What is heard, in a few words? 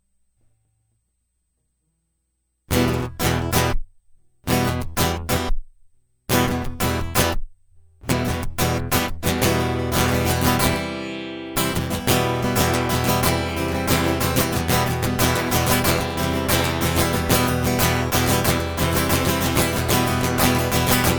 Guitar Loop